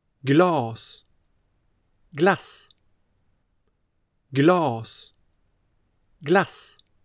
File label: glas glass; villa volvo vovve